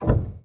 piano

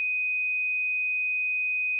2500 hz sine 2 seconds

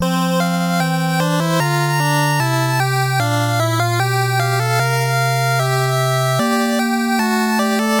opening theme; final-fantasy

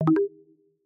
Funk

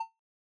hover

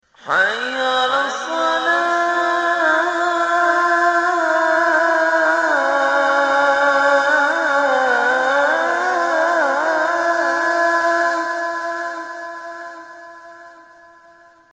short iqama

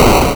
Explosion

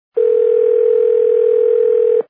outgoing call

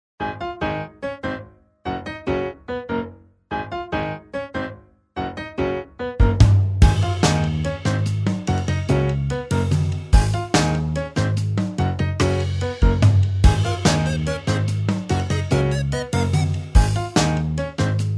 bg music

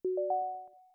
Accessory Connected

